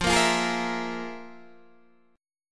F Minor Major 7th Double Flat 5th, Guitar, DADGAD

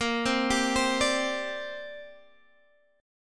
C 7th Suspended 2nd A sharp

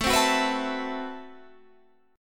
A Minor 6th Add 9th, Guitar, Standard; Am6add9 strummed; 17,15,16,16,17,17; JGuitar

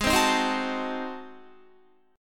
G sharp 7th Flat 9th G sharp down Guitar, chord; G#7b9; strummed

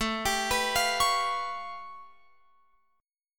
A Augmented 9th A Guitar Open F; arpeggiated; x,16,19,18,20,20; JGuitar

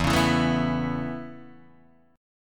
A Major Add 9th E Guitar Standard, chord; Aadd9/E strummed; JGuitar

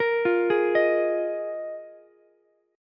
D sharp Suspended 2nd Suspended 4th, Ukulele; arpeggiated; chord; 15,17,16,18; JGuitar

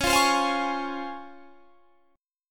C sharp Major 7th

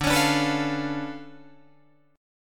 D Minor Major 13th, down, Guitar, DADGAD

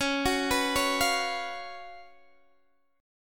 Gb Major 7th Suspended 4th Db, guitar

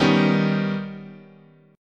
Eb Augmented 7th, chord

